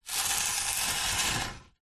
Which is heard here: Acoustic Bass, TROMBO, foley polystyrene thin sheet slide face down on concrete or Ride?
foley polystyrene thin sheet slide face down on concrete